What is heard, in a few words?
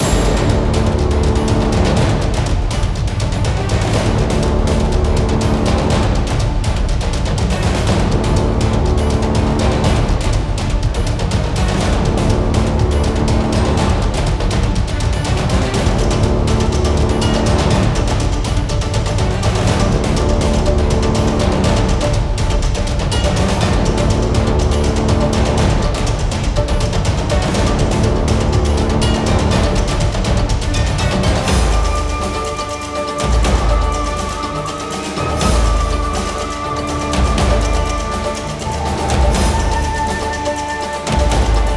Battle in Forest